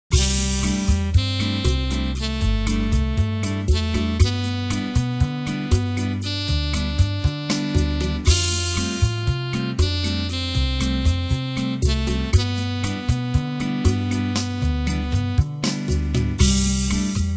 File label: sax and salsa